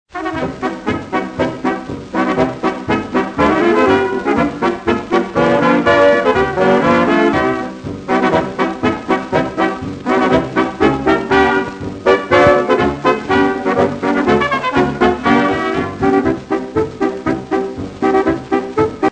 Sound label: POLKA